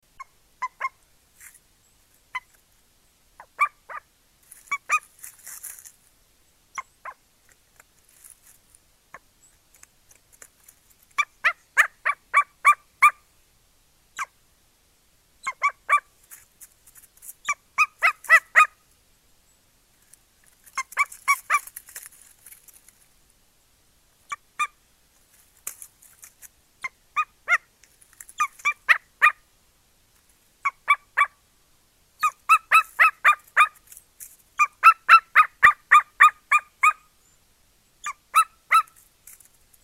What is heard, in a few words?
Golden Eagle Sound